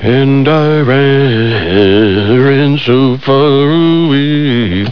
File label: song